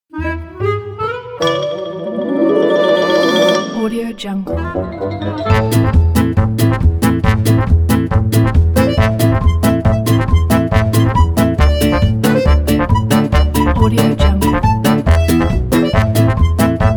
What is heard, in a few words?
whimsical